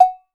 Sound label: MELLOW AG, Percussion; Voice Crystal - Steve Reid Definitive Percussion; BRAZIL SET